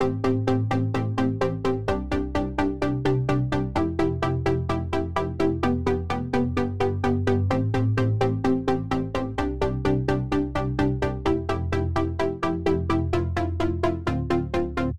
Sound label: 128 BPM A Min Chords; Loop Stems; Cymatics; Paradise; Breakdown Loops